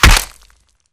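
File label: Bullet Light Ballistic Concrete impact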